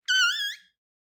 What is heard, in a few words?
Fart Whistle; SoundsCrate